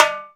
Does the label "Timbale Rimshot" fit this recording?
Yes